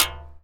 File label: Hit Tin